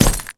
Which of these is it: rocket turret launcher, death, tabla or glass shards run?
glass shards run